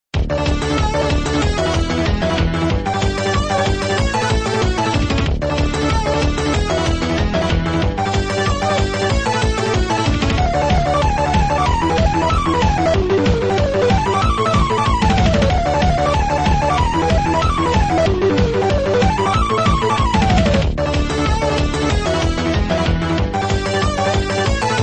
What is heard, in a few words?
MUSIC; Dyna Blaster; Invincible